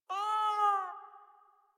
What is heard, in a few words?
Kitten Meow Agitated; Enemies; Warframe; Catbrow